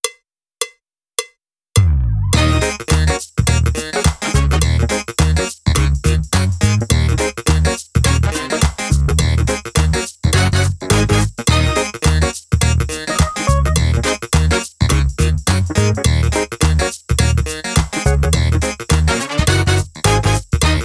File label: Silly Superstition drumless